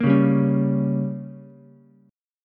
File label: Bb Minor 6th, Ukulele; strummed; JGuitar